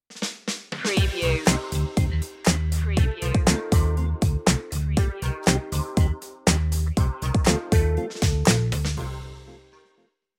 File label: funk beat stinger